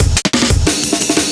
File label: Headbang Break